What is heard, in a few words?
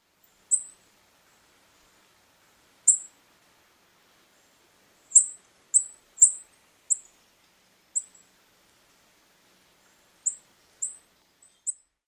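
calls flight notes, Tennessee Warbler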